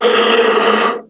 Bird Monster Breath; Playable Tutorial Level; TR2

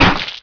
Monk Takehit; Playable Tutorial Level; TR2

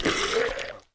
Mummy Gurgles; Playable Tutorial Level

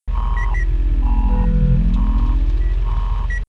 Hitech Room Loop; Playable Tutorial Level

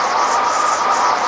Soft Wind Loop; Playable Tutorial Level

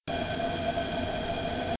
Kitchen Hob Loop; Playable Tutorial Level

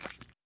Skeleton Footsteps; Playable Tutorial Level

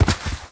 Roll; Lara

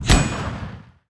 Grenadegun Fire; Playable Tutorial Level

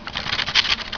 Spider Moving; Playable Tutorial Level; TR2

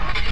Scale; Playable Tutorial Level

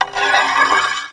Door Squeak; Sett door; Playable Tutorial Level

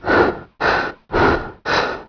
Henchman Heavy Breath; Playable Tutorial Level; TR2

